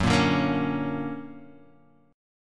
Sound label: D Augmented Major 7th, chord